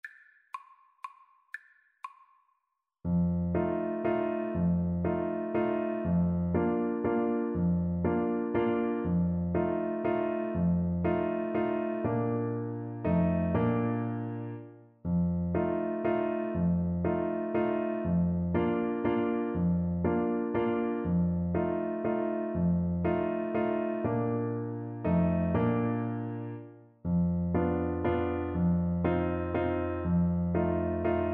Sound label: german dance TPT; schubert